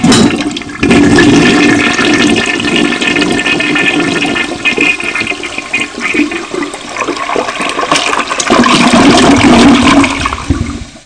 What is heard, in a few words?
Toilet Flush